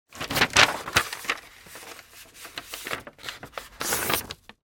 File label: PAPER FOLD